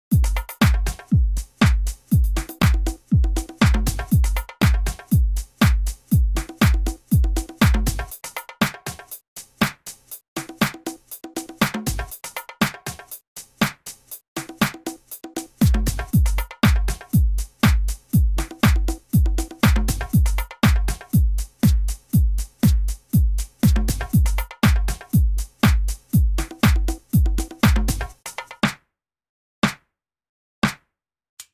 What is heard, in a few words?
Urban Beats